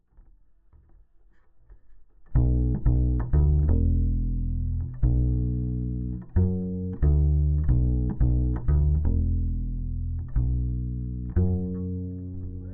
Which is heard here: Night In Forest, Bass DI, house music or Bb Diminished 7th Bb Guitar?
Bass DI